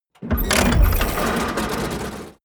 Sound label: tank medium damaged